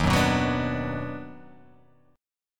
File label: D sharp Minor Major 7th Sharp 5th